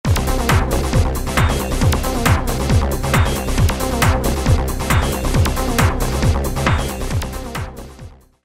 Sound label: Psy Trance, Roland D2